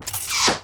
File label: Arrow Movement Fast, Weapons & Explosions; Sony Pictures Sound Effects Series Volumes 1-10; Sony Creative Software